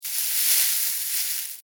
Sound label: Foley Grass Movement Foliage Single; Environmental Sound Effects; Environmental (Sound Effects:Nature)